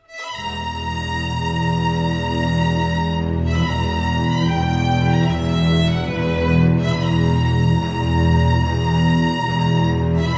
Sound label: Persecution BGM; Karnan